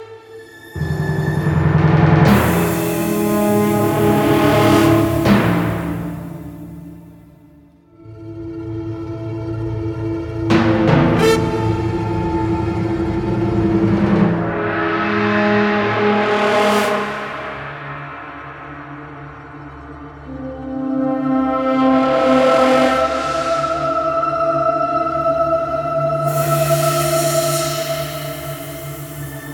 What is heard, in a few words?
TENSION LOOPABLE